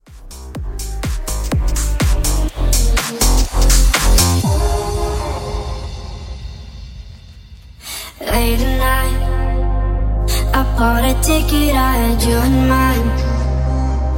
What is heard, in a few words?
Future House Extended; Remixfeakz